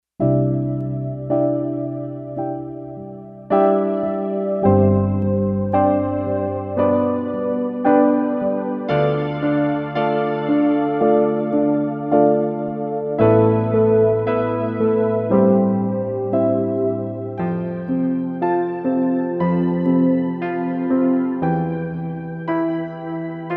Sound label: Mellow Pia Str